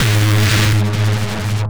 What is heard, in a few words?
Bazz Reese; Sequel 3; Dubtekk